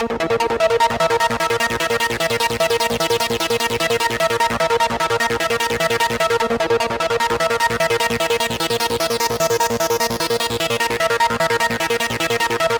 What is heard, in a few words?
Nineties Arp; 100 acid vol 3